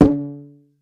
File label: Rubberband